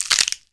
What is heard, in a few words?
STEP; mahlendur